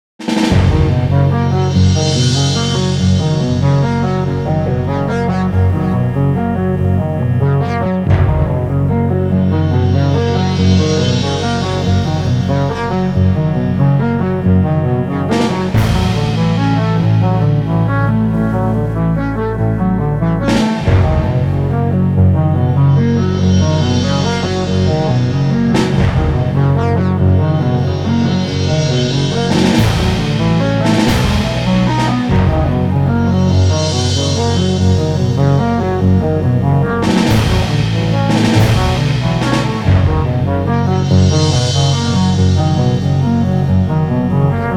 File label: Delay